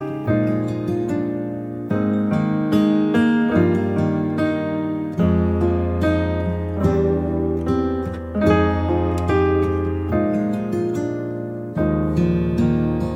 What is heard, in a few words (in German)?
Kindlein Playback ohne Backings